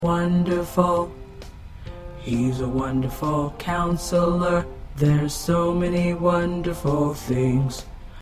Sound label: Bass